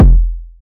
short reverb kickdrum e key, Royality free bass drum sample tuned to the E note